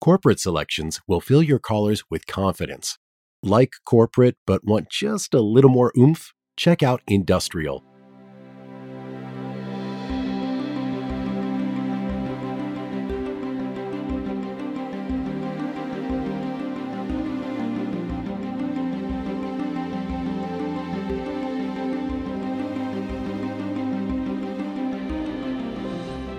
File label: Corporate, music on hold